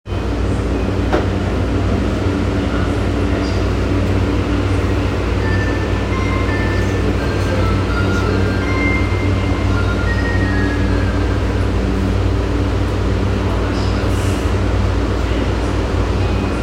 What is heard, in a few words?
train sounds; 南北線王子神谷南